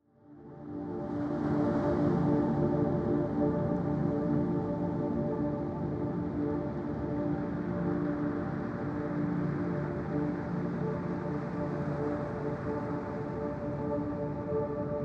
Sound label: Deep Sleep Relax Music